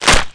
neck snap; Messiah; STRANGLED

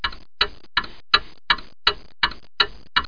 warning

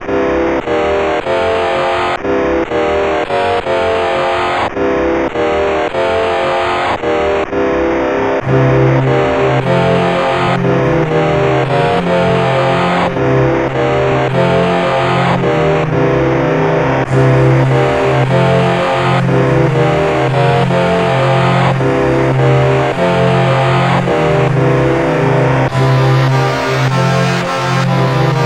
heavy bass attack; OctaMED SoundStudio V1; mug-members; les hodson

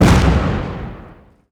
Tank Gun-Medium; 1944 Battle Of The Bulge

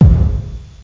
tr909 bass; The Epic Collection 3; Epic Marketing; muzak samples

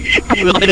gulp; Top 100 Kids; zargon

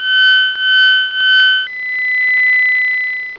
Victory; Ultima III; Ultima Sounds

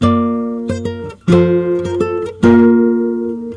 TUNING; FRACTIONS PIZZA

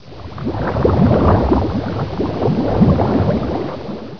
Ship Sink; Pirates! Gold

